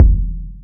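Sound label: bass drum, right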